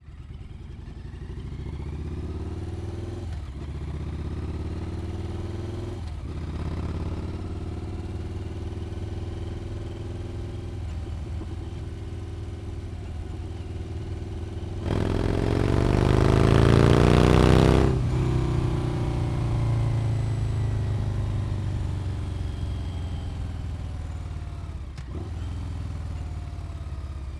Motorcycle, Victory Vegas, 96 Cubic Inch, 1507 CC, Onboard, Start, Drive, Fast Speed; 2004; Airborne Sound; Punch It